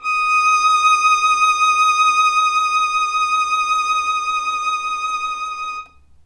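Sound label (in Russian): скрипка арко вибрато одиночный D5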